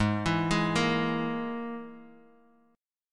Gsharp Suspended 2nd Sharp 5th, Guitar, Open F; arpeggiated; x,3,4,3,1,x

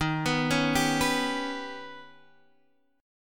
Eb Augmented 7th Eb down Guitar Half Step Down

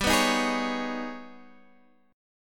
Ab Minor Major 11th

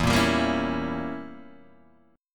E Diminished 7th E, Guitar Open G

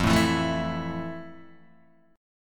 F Major 7th F, Guitar, DADGAD

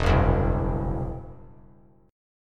E Suspended 2nd Sharp 5th, Esus2#5 chord; strummed; 4,1,3,2

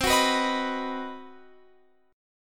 C Minor Major 7th Flat 5th chord, Guitar Open F; strummed; JGuitar

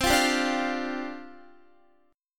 C Suspended 2nd Suspended 4th, down, Guitar

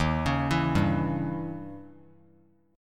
D sharp 7th Flat 9th D sharp; arpeggiated; D#7b9; 15,20,19,17; JGuitar

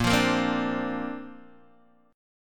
A sharp Major Add 9th, Guitar, DADGAD

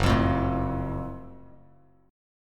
Gb Minor Major 7th Gb down Bass Standard; strummed; JGuitar

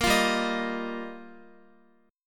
G Minor 7th Sharp 5th G, Guitar; strummed; 4E,4A,5D,5G,5C,6F; Gm7#5

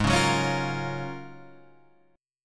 Ab 13th Suspended 4th Ab; strummed; JGuitar

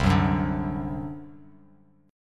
C Suspended 2nd Flat 5th C down Bass Standard; Csus2b5 strummed

